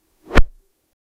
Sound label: multiple cards shuffle; game